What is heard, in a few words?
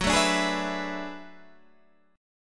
B Minor 13th F sharp